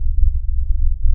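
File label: Ambience Sci-fi Energy Loop; SFX Kit; Sci-Fi Loops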